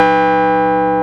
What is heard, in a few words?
JX CHIME, Jupiter Synths; E-MU Formula 4000 Series Vol. 3 – Analog Odyssey